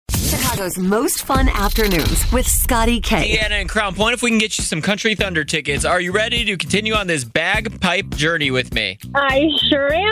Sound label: bag pipe cover songs